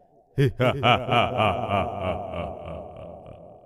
Evil laugh; svencoop; pixel

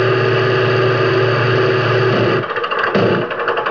engine stall; svencoop

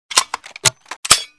gl reload, weapons; svencoop

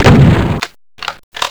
supershot; svencoop; doom

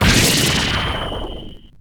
spikes; svencoop; thearena

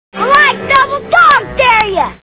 dog dare; firearms

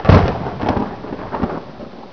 thunder; dod; mapsounds

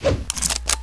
deploy, weapons; svencoop

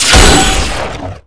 Razor

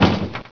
latch unlocked

